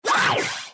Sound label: gargoyle hit